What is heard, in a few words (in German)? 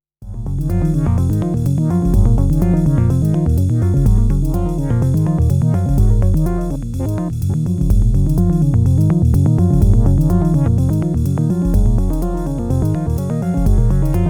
motion seq vs manual resonanz